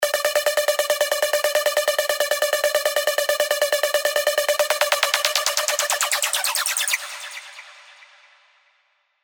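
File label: arpeggio, Sylenth1; Viking Raid; alonso 19 year anniversary pack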